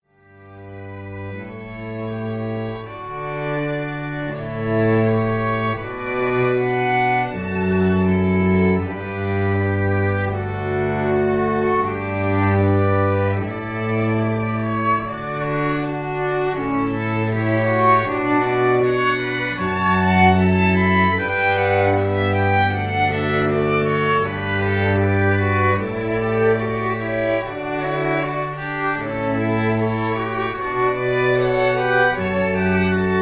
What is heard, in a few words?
strings